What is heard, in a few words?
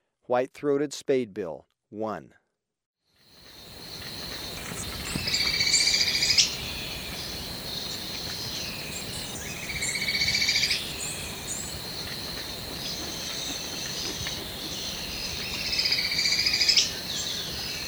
White-Throated Spadebill, Birds of Ecuador; S. America